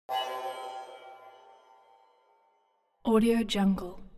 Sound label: ui sci fi facility ai awake damaged severe